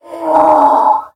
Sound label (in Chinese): 驴愤怒声